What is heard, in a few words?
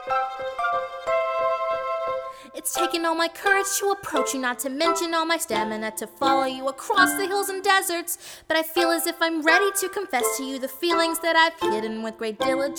vocal